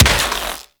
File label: Rifle Shot; Warframe; Infested; Quanta